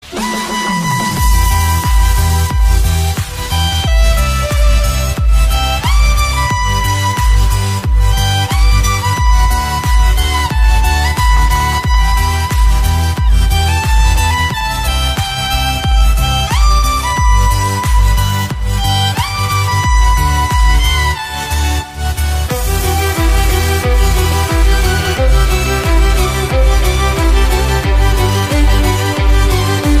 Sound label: Violin Cover